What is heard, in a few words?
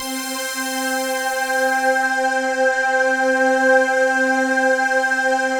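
pulse; Masterbits; Vintage Voltage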